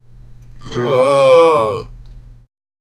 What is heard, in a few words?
falling sound of a man